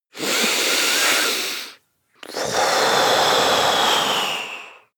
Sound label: breath male deep blow